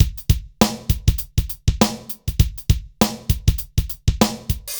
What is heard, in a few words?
dry Loop, BPM 100